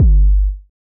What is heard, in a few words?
Kick 49 Hz Processed